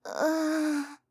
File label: Moan; My Cute Commissar